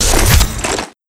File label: Scifi Ammo Pick